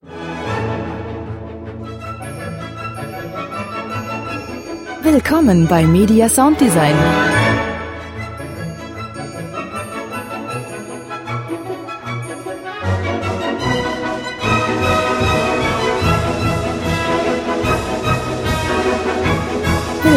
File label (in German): klassik tritsch tratsch polka